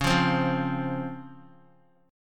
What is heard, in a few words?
Db Major 7th Db down; strummed; JGuitar